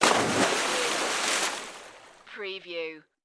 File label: Splash Pool Dive